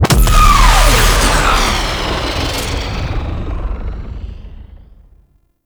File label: Railjack Helix Missile Fire; Warframe